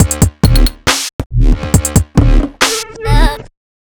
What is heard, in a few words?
Grime Kit